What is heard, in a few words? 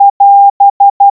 wabun o, morse